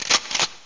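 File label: shot reverb; alienbreed3d2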